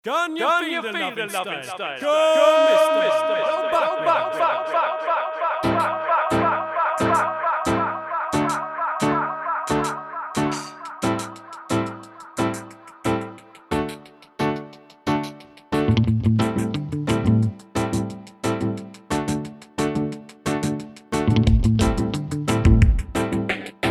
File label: Clubmix